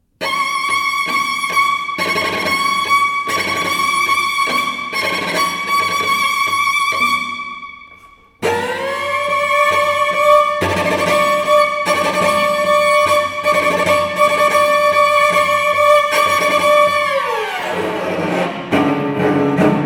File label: Solo Cello, Fortissimo; 'The Book' For Solo Cello- I. Fortissimo; Grāmata čellam; Pēteris Vasks